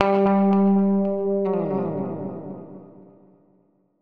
Guitar Notes G; Zero-G - Future Wave

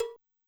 Cowbell Low, 505; classic machines

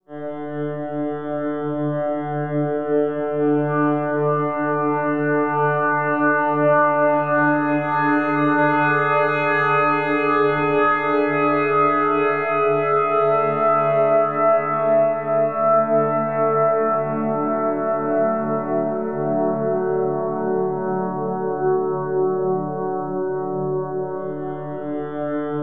LYDIAN LOOP; Bolder Sounds - Granular

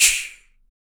SHAKER, MALE PERC; Spectrasonics Vocal Planet; Jazz FX

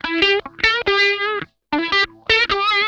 RASP LICK, Funk Guitar; 90 s Sample CDs; Zero G

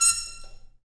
BRS, Harmon Stabs; Roland L-CD702; Harmon Amb

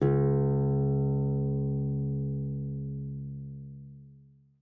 C#2, guitar; Xsample VOL-2

